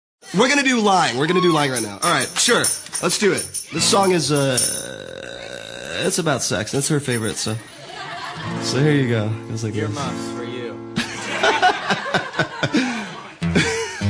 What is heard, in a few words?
acoustic